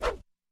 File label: swish arm or weapon